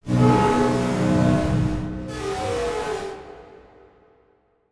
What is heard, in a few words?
Production Element Title Transition Bed